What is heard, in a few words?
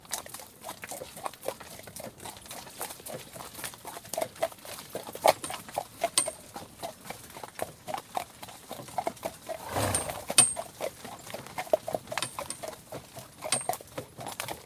DRIVE EVE HORSECART